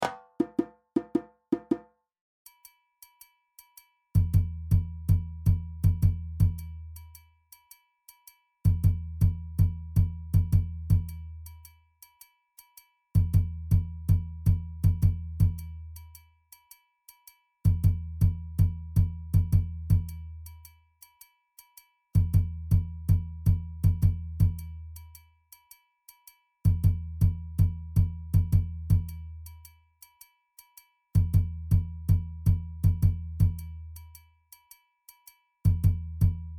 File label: Doundoumba